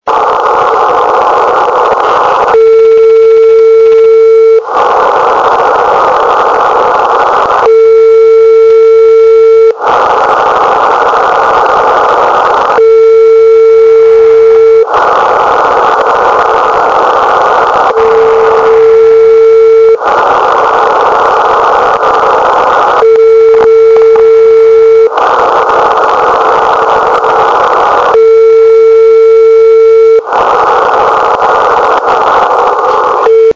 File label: HAARP echo